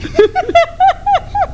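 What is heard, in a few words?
FEMALE LAUGH; VOICES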